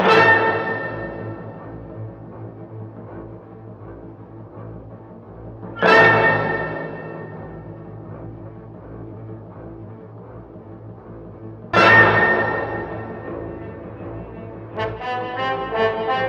horns strings, Sad; Loops

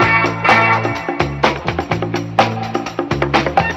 full, Loops Phonky

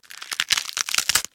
bone break rip off

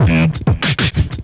freaky bmp, Beatbox, Drum loops

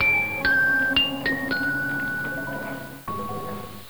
keys, Sad Loops

